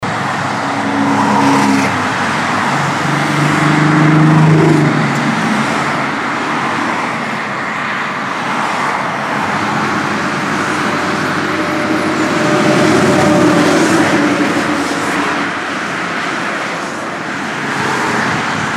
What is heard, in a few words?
highway atmo